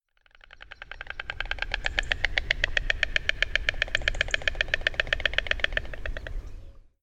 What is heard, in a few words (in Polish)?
bocian biały Ciconia ciconia